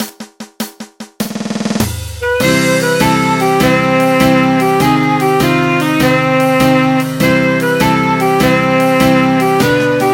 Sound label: Northern Gloom